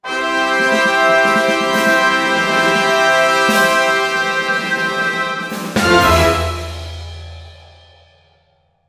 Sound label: victory; freepixel; WordJourney